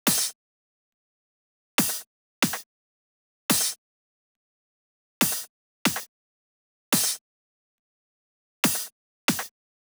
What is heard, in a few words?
140 BPM Break BD Attack; Essential Clubsounds Vol.5; Catch a Plane Kit; Root A#min; Drums & Perc